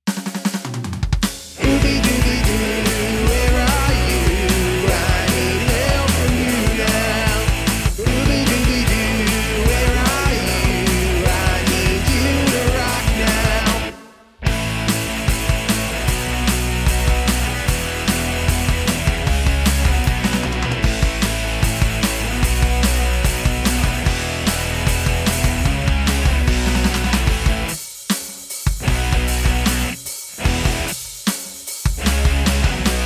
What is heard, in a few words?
149BPM A major; song in a hat 2025